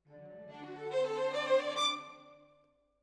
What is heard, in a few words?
Violin Solo